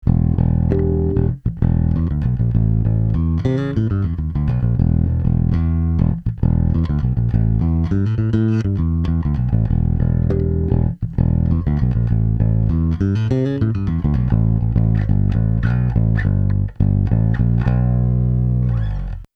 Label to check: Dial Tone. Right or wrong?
Wrong